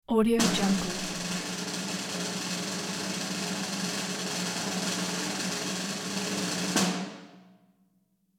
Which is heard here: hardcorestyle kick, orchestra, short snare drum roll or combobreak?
short snare drum roll